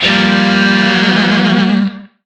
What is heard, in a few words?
Shawzin Phrygian Chord J; Music; DE; Warframe; Lizzie Shawzin